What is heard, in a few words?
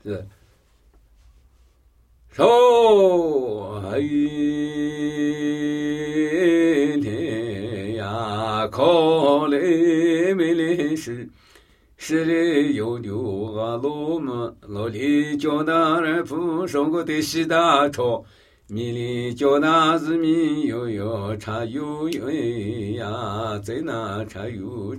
Namuyi Tibetan Ritual Chanting